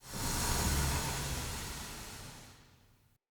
Smithy Steam Burst; Ambience; Soul Plane

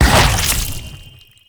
Laser Discs Proj Shot; Infested; Warframe; Infested Lich; DJ Rom

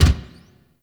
Gear Shift Up, Motorcycle; Warframe; Vehicles; Motorcycle Ninety Nine

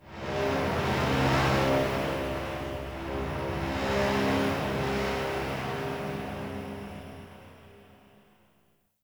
Metal Moan Texture Large, Corpus Ship ambience; Textures

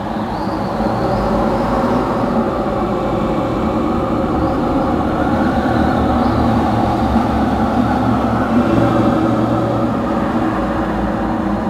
Shipyards Exterior Opening Ambience; Warframe; Grineer Shipyards

